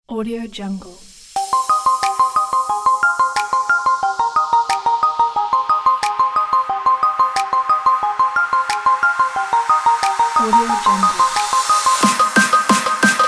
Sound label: future bass